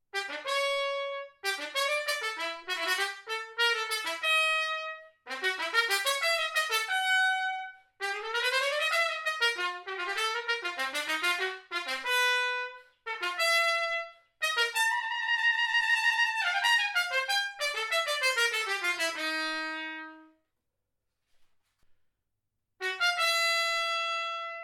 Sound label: Bb brass